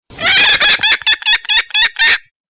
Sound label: brown falcon